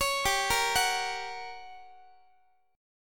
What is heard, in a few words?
G Minor Major 7th Flat 5th, G, down, Guitar, 4A 4E 5A 5D 5G sharp 6C sharp; GmM7b5; arpeggiated